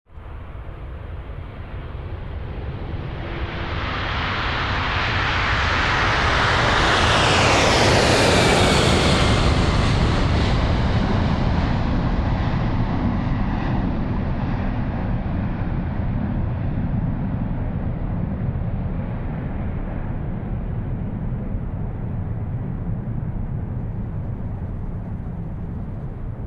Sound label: 747, Bierset